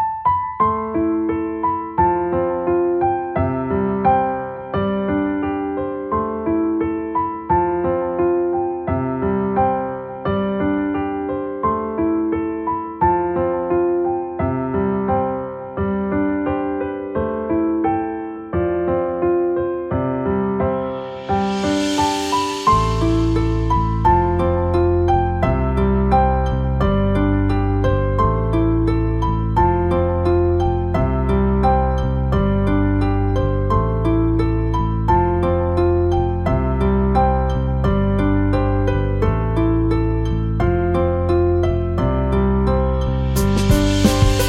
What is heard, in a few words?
call bg